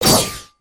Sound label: arm heart pickup, ui; aronwk collection; ndaudio